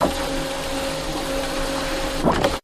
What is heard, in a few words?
Dot Matrix Printer F; printers